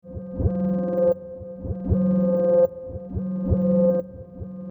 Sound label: pad acid